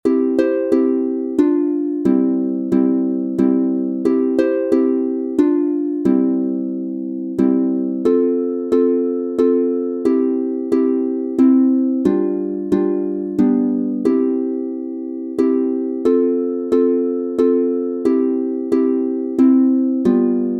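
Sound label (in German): C Dur 3 stimmig, Harfe; Heiliger Nikolaus du braver Ma´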